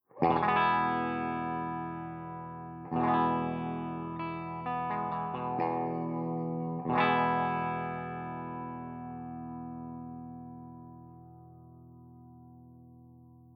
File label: BRILLIANT MIDBOOST